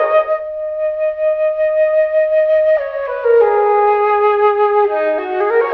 flute, Loops, Sad

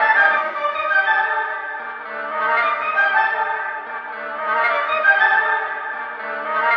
horns, Loops, Sad

